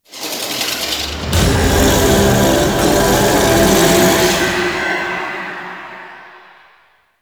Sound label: Swarm UI Area Cleared Final; Warframe; Entrati